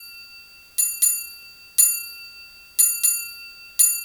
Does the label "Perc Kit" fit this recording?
Yes